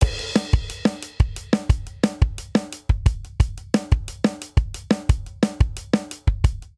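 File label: Straight Five Rock Verse 5/4 177 bpm, drums; Ableton; Factory Packs; Drum Booth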